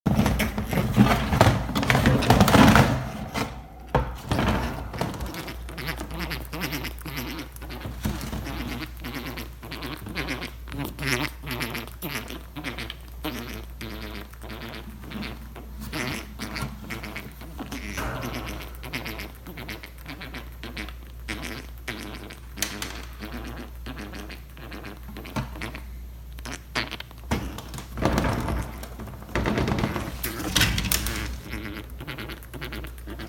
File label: Chinchilla; چینچیلا